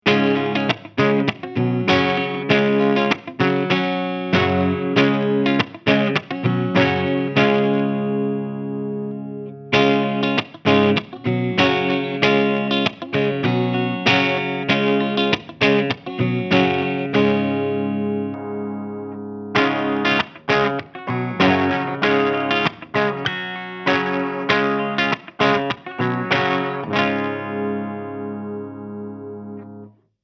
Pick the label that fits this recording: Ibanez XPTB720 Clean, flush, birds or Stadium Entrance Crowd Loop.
Ibanez XPTB720 Clean